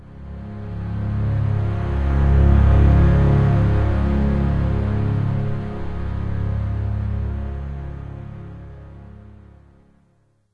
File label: swell